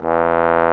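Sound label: BRS BSBONE, Bass Trombone Solo; Roland L-CDX-03 Disk 2; Bs.Trombones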